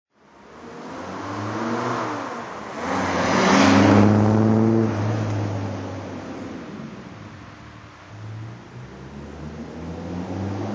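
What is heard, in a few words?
austin healey 3000 mk1 driveby, engine sound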